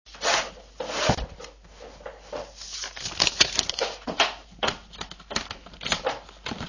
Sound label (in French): texture fouille